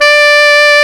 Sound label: SAX D4